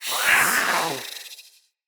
Catbrow Feral Vocal Attack Medium; Enemies

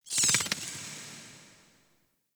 Interior Cave Bat; Warframe; Duviri; Ambience

